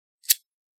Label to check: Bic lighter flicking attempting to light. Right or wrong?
Right